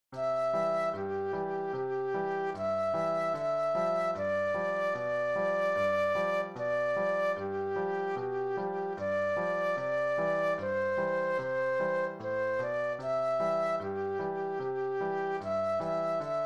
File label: flute solo